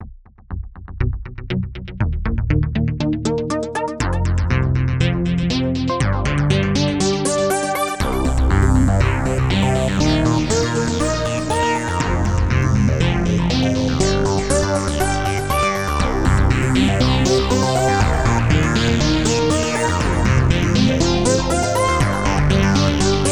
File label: Arp; NNXT